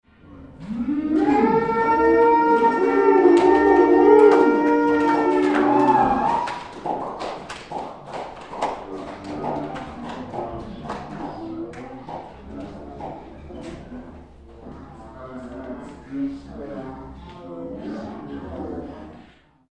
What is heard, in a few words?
Crowd small at end of pub gig 2, Cheers and Applause